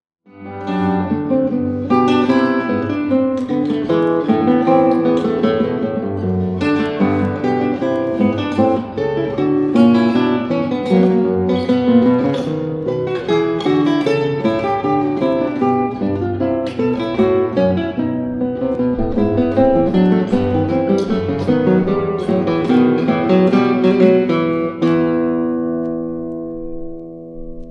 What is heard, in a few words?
Bourree